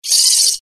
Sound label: toy robot